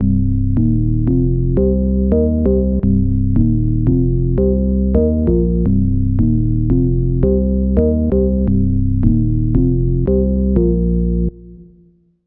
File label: Organ Riff; KJ Sawka; Drum n Bass & Beyond